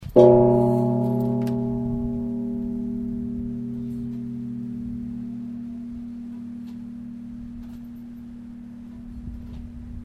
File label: steel italian church